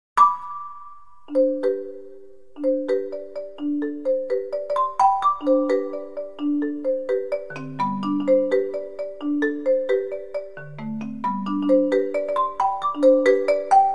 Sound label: Marimba Dances Dance